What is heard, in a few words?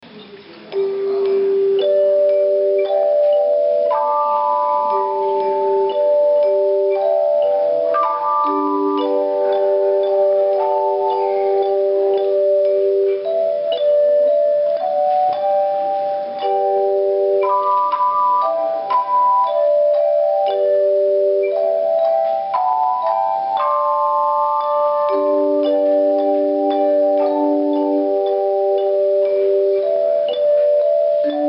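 handbell; Gentle Mary Laid Her Child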